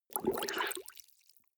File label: clothes water wring soft, haushalt; haus garten